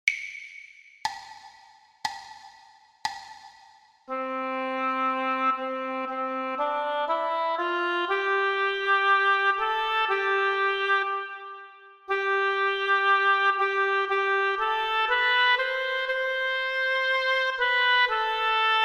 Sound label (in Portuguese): percepcao ditado